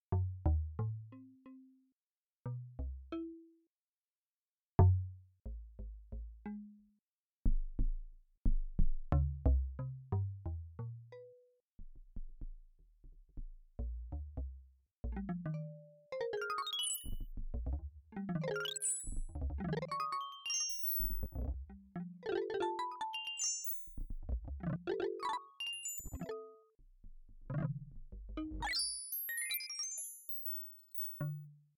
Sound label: arp xylo housier